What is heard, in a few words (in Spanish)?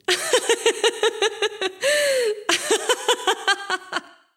risa